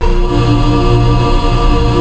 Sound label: YAMAHA FM; Rubber Chicken Software Sample Bank